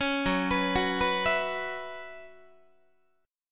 Gb 7th Suspended 4th Gb down Guitar, chord

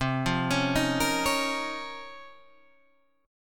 C sharp Major 13th, chord

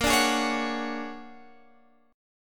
Bb 7th Sharp 5th Sharp 9th Bb; Bb7#5 #9

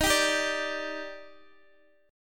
D sharp Major 7th, Guitar Open D

